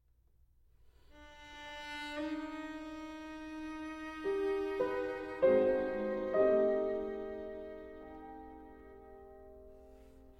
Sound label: Cello Sonata For Cello and Piano in G Minor; Lento - Allegro Moderato